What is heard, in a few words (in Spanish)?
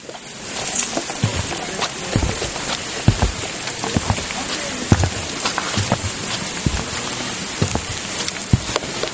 puentes